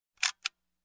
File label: Camera Shutter Click